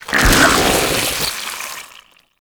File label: Infested Drop Pod Spawn Enemy; Warframe